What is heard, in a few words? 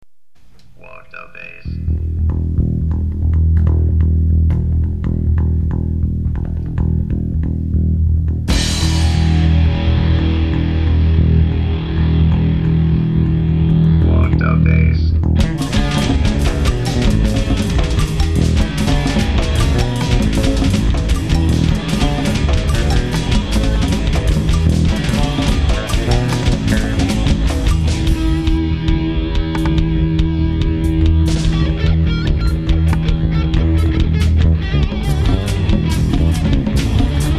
Walk The Bass